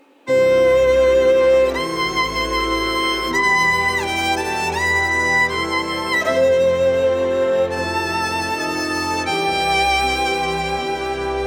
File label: Violin